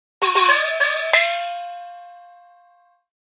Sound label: Gong, Notifications; Lenovo A316i